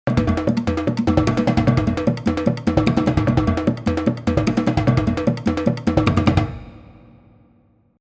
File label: vibes percussion; chiqui audio